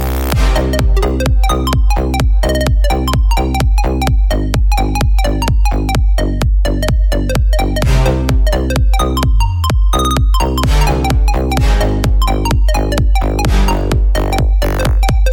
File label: Electro Ringing Tone